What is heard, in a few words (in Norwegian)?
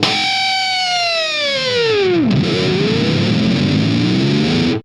elgitar